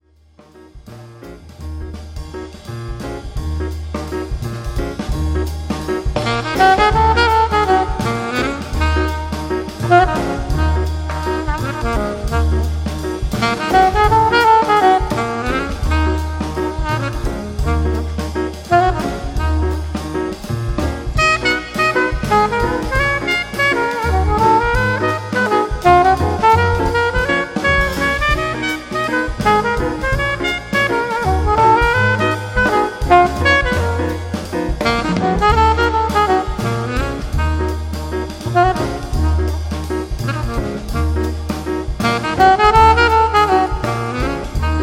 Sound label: Solo Sax